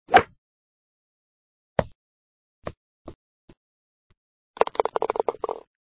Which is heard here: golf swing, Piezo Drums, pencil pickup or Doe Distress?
golf swing